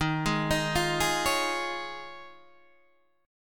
Eb 7th Suspended 2nd Suspended 4th Eb, Guitar Open D